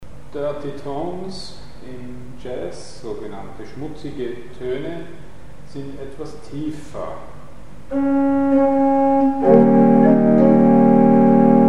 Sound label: Dirty tones; Mikrobuch